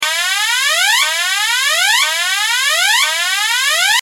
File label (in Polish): ton